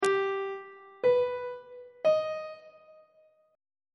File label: e melodic minor chord 3 is g augmented on treble clef